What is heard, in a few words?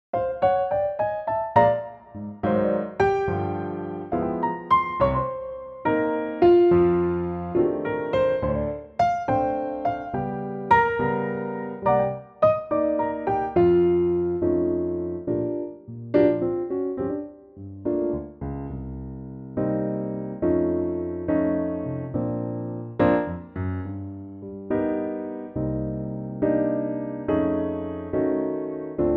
Bb piano